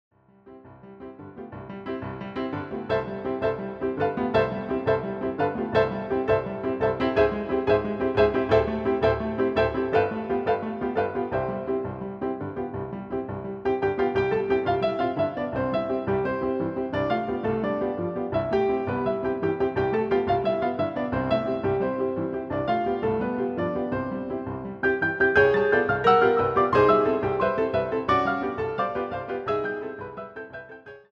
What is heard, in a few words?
Jamaican Rhumba